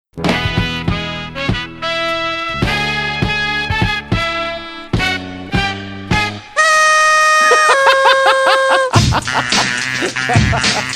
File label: exciting celebrate music with laughing